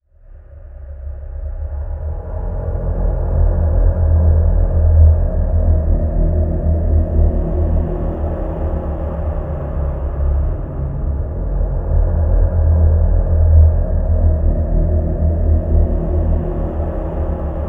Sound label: environment graveyard stinger winds long